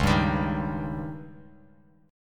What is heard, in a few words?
Db Suspended 2nd Flat 5th, Bass Standard; strummed